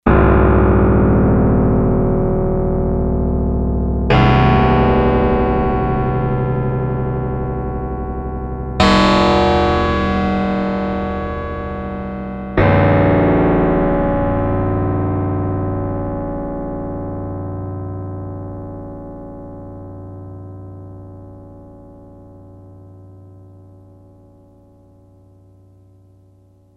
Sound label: Gong, Yamaha TX802; Factory Patches; Voices